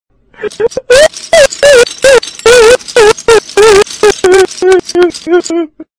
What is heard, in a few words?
crying noise filter